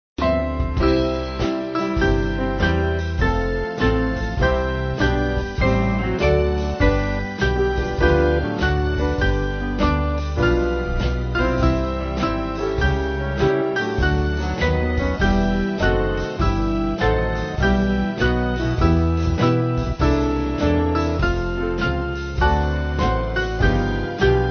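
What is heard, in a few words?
Band, Music Backing Files for Churches; Strong Righteous; Melita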